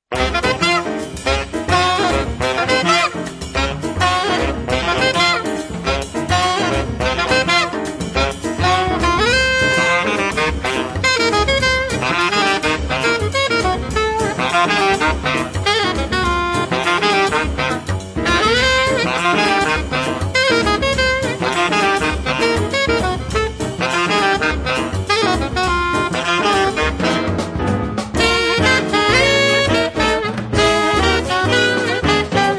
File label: Swingin The Samba